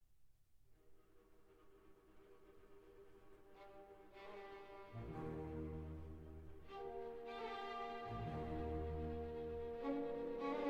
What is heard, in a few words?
Concerto No. 1 in G Minor for Violin and Orchestra